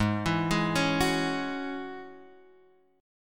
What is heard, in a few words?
Ab 7th Suspended 4th Sharp 5th, chord